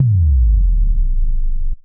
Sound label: Sub FX 140BPM; Sound FX; Vengeance Samples; D minor